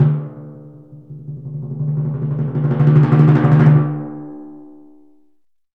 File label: PRC TIMP, Timp Cresc, Orchestral Perc; Roland LCDP03